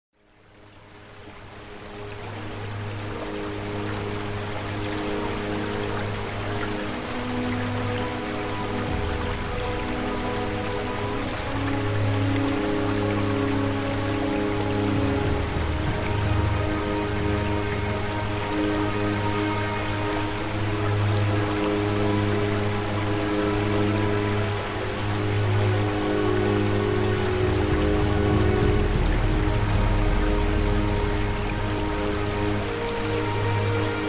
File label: white noise meditation 3 Hz delta